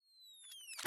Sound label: Mounted Camera Timer Start